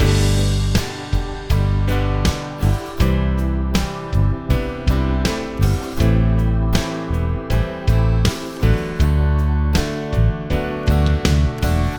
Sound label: Using Barre Chords Exercise Loop